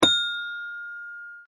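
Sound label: xylophone; Toy Story 3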